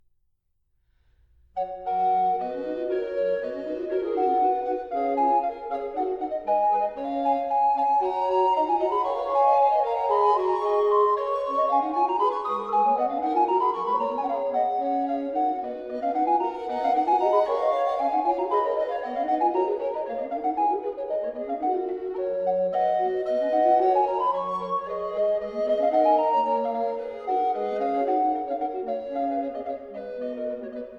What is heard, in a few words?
Recorder Quartet; Ich weiss nit was er ihr verhiess; Farallon Recorder Quartet